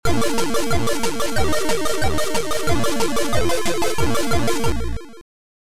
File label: BEGIN LEVEL; Snowman